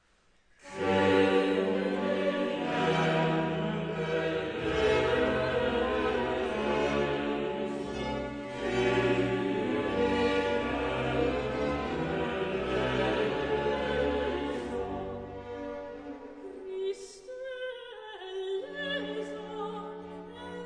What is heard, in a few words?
Missa brevis in G major, Kyrie; Harnoncourt, CmW; K.140